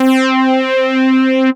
Lead, analog synth lead; Ableton; Factory Packs; Synth Essentials

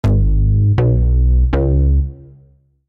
Bass Bb minor 81 bpm; MIDI Clips; Bassline; Grey Panel; Ableton; Factory Packs; Chop and Swing

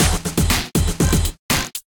Scorched Kit, Drums; Ableton; Digicussion 2; Scorched Kits

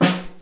SN1 Room, Snare; Ableton Foundation FreePacks; Snare Designer

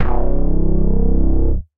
Past Bass; Drive and Glow; Ableton; Factory Packs

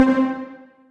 Pizicatto String Instrument; Synth Pad; Ableton; Factory Packs; Synth Essentials

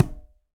Conga A Heel; Latin Percussion; Drums; Drum Hits; Ableton; Factory Packs

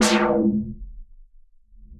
Weird Splash; Effects; Ableton; Creative Extensions; Poli

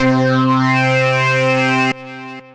Buck Jones Lead, Synth Lead; Ableton; Factory Packs; Chop and Swing

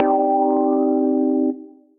Gold House, Piano & Keys; Ableton; Beat Tools

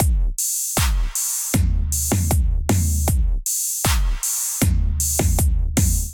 Underground Rush Kit groove 130bpm

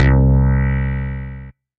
Manky Bass; Drive and Glow; Ableton; Factory Packs

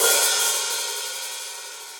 Hihat Open Shank Direct Natural; Drum Hits; Standard Hits; Ableton; Factory Packs; Session Drums Studio

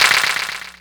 Clapbox Pitch; Ableton Foundation FreePacks

